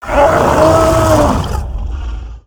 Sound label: Augmented Elekk Attack